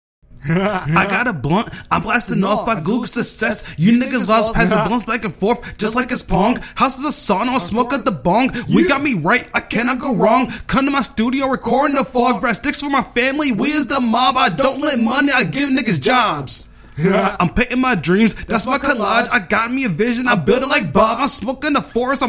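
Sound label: hip hop rapsing 123 Am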